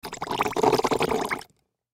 Gurgle Submerge, Water Gurgle Object Submerge Bathtub Small; Dripping; Blastwave